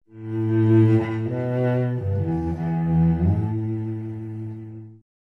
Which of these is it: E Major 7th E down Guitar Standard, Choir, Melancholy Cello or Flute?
Melancholy Cello